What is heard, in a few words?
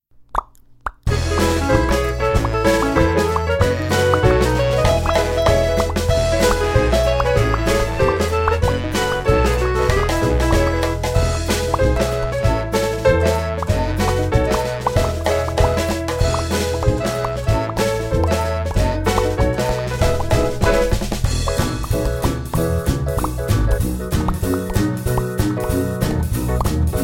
Pop The Bubbles Karaoke